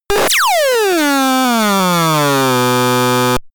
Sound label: buzzy lasergun; krieg-waffen; soundpool